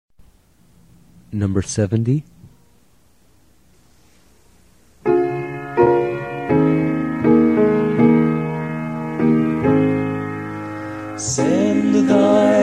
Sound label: hymn; Hymns Old and New; Send Thy Light